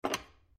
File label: open, chest; magicworld